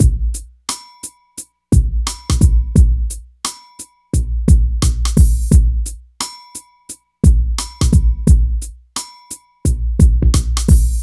drum, Hip Hop and R&B Drumloops; Best Service ProSamples vol.01; AKAI

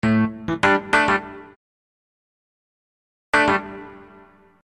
Da Phunk Rhodes; Construction Kit; HIP-HOP; Sonic Foundry (Sony Creative Software)

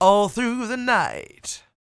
SING W, Vocal Planet Blues & Soul, BLUESY; ILIO

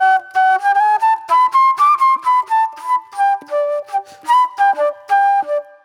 mellow soul flute; Sonic Foundry (Sony Creative Software); Manhattan Flutes; Soul 1

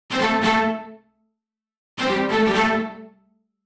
Stacc Str 128 A, uk garage, Synths; 128bpm Lines n Loops

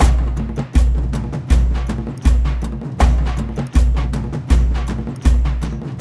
AF TRANCE; Spectrasonics; Heart of Africa 2

